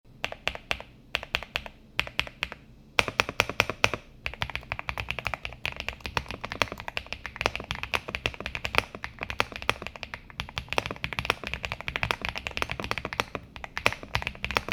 rt85 sound test, mechanical keyboard; epomaker rt85 mechanical keyboard

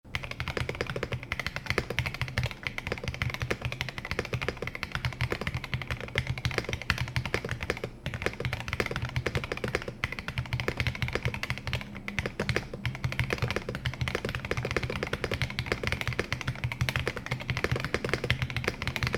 keeb sound test, keyboard; hyte keeb tkl keyboard

